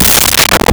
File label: Surdo; Nintendo; July 2020 Gigaleak